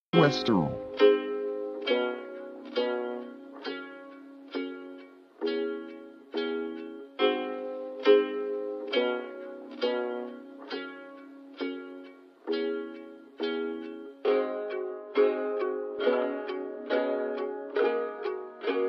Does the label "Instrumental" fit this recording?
Yes